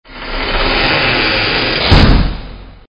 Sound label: Door Creak